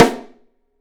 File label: Drums, MARCHING; 3D Drums; OP MARCHING